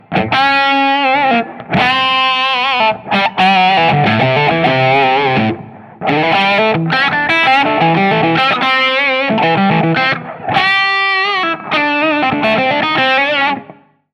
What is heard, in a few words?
Lead strat B pickup Closed back 4x12 cab; Gain 15; Boost on; Treble 14; Middle 11; Bass 10